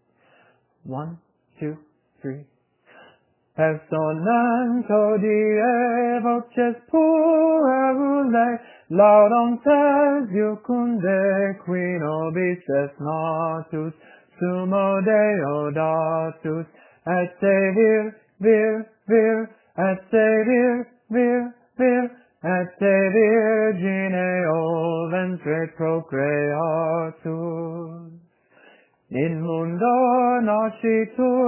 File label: Phone recording; Piæ Cantiones; Personent Hodie